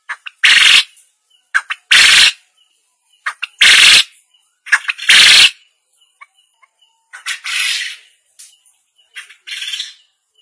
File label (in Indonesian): suara burung puyuh